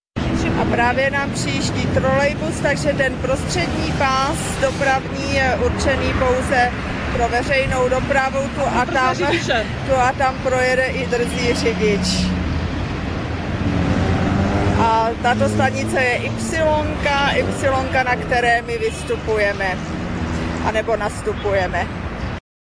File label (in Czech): trolejbus, Quito; Video Ekvador